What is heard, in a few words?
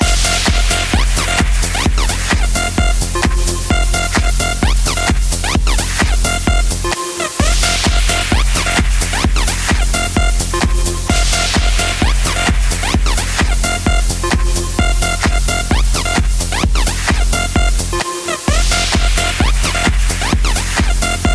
shuffling; DrZharksMoCreaturesModv4.4.0